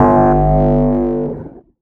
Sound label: Title Transition Descend; Adobe; Production Element